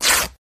Cloth Tear High

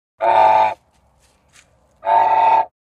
Goose Honks Bizarre

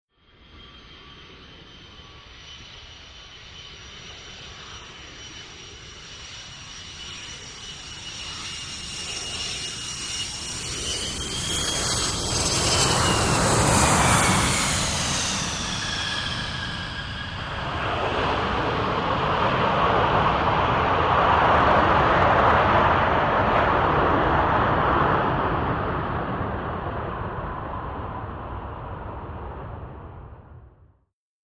Lrg Jet Landing Touc

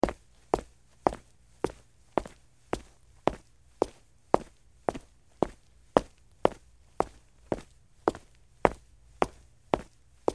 Footsteps Brick Fast

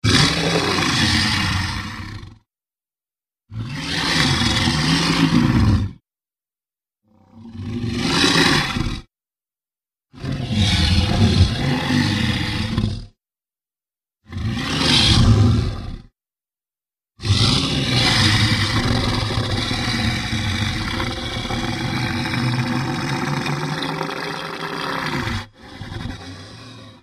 Monster Growls

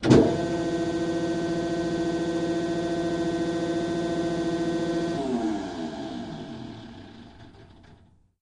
Hydraulic Notcher M, factory

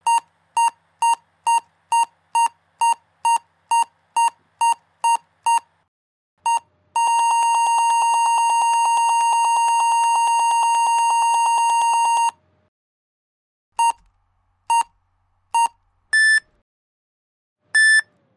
Computer Laptop Beeps, computers

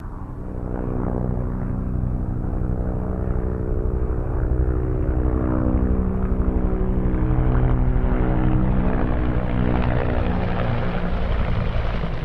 Cobra Heli By Left Cen, AH-1 Cobra

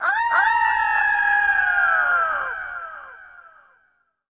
Eagle Echo Attack

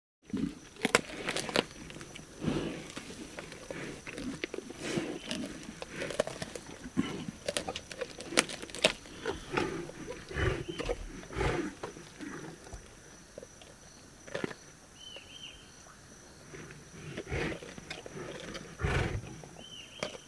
Lion Feeding Group; big cats

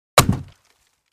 Crate Palette Type 2 Hit with Sledge Hammer

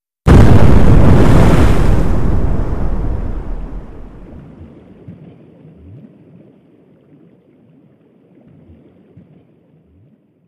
Underwater Explo, explosions

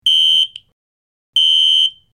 Alarm Whirring Beep; hi-tech-various

